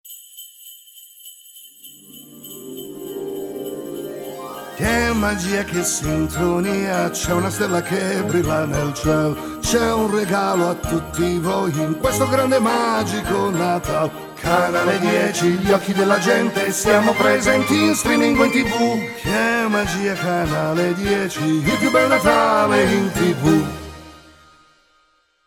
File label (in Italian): Jingle di Natale; Canale 10